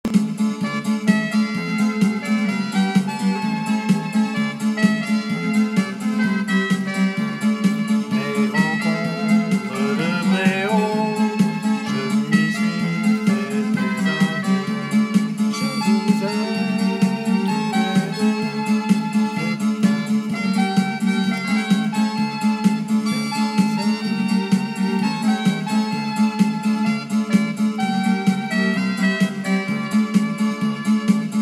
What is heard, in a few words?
Jingle; Breau 2019